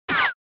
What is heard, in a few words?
Retro Anime Gun